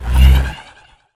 ghost gun spawn; garrysmod

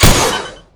punish rocket; garrysmod

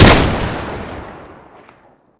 new rev; garrysmod

